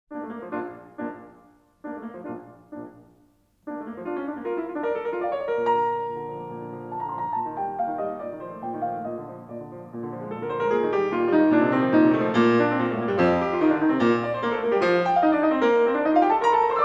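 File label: Piano Sonata No. 11 in B flat major; Wilhelm Kempff; Beethoven; Piano Sonatas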